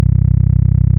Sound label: Low Hum 32 Hz